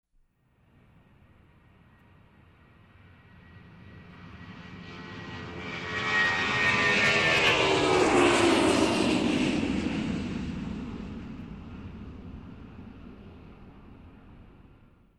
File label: Aircraft on runway lifting off